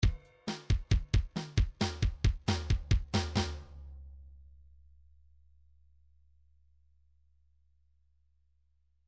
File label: rock fill, midi